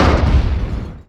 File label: missile hit; weapons; Stellaris